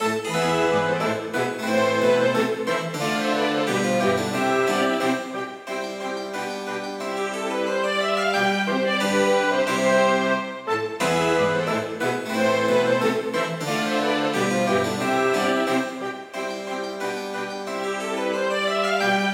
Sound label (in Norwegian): Gavott en boure for obo, fagott, strykere og cembalo; Hertz